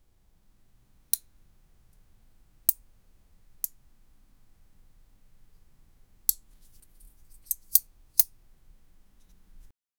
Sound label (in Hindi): pocha lagane wala aawaj; tool